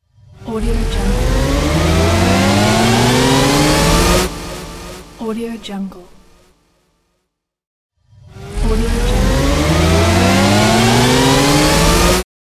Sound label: radio energy buildup